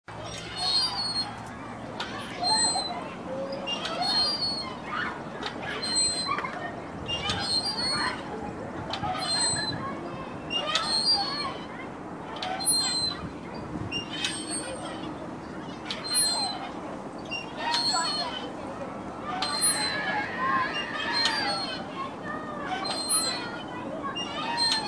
kacheli s detmi; Звук качелей раскачивающихся на ветру, металлических, на цепях или веревочных

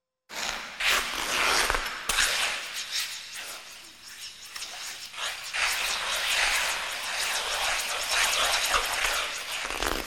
vrashhenie; Звуки катания на коньках

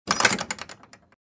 shhekolda vorot

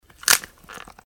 hrust pri perelome

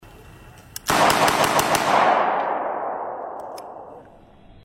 mateba automatic ochered

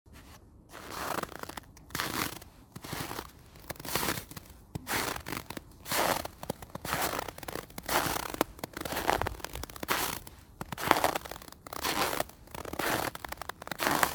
medlenno po mokromu snegu

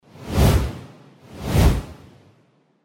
dva poryva vetra; Звук ветра; Звук ветра для перехода в видео или для монтажного перехода в аудио монтаже

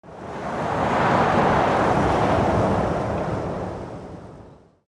Zvuk poryva vetra

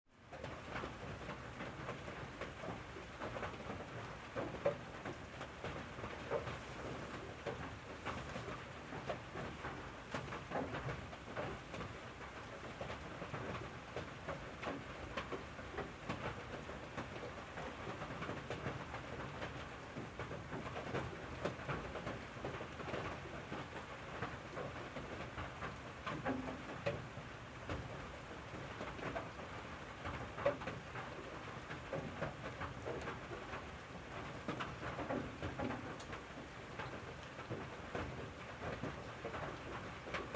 dozhd v interere

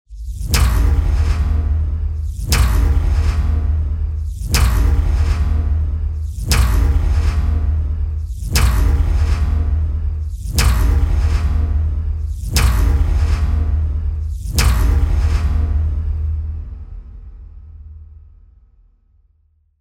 jeffekt udarov po metallu s drozhaniem; Звуки обратного отсчета

